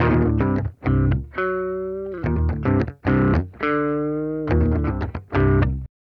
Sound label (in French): guitare claire